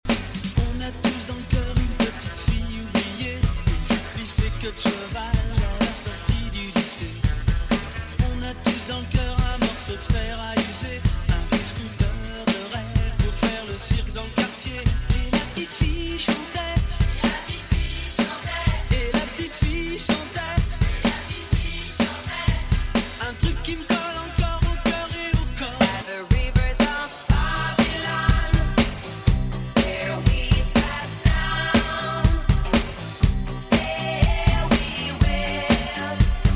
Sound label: 70s mix; Dj Wasson; Resumen